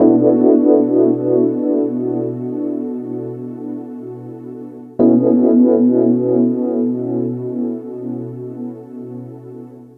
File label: Electric Piano, Loops, Keys